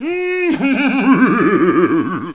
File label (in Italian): tono di notifica risata